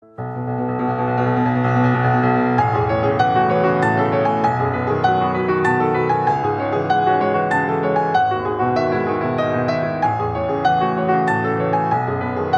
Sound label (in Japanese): ピアノ; 聲の形; A Silent Voice